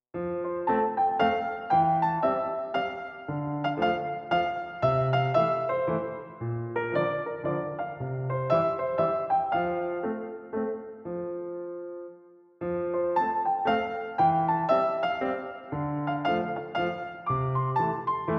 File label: Piano Humor; The Evolution of Our Love